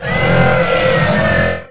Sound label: fab Verm Scream; The Elder Scrolls III - Morrowind